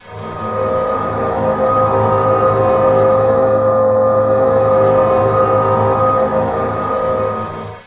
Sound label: detroit techno loop